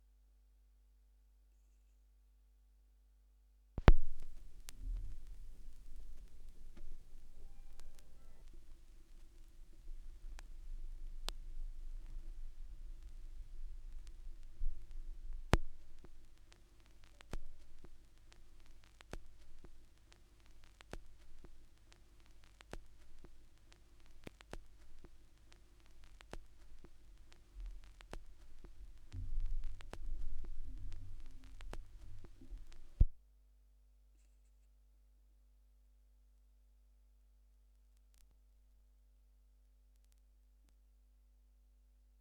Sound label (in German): schallplattengeraeusche